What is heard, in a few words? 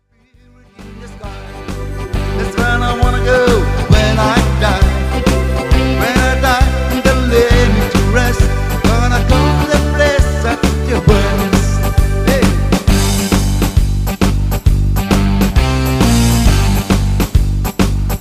Rock Medley